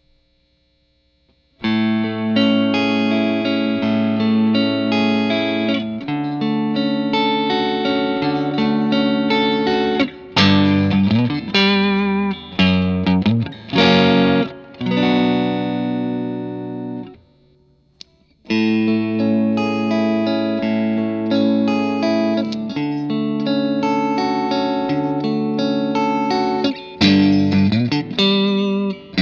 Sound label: G&L USA LPB Legacy; Minor Chord